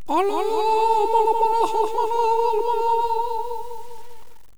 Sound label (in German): minarett; khanat; voices